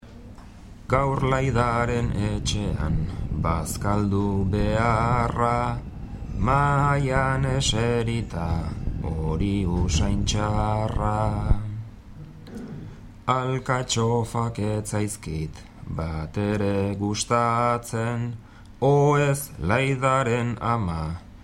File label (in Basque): bertsos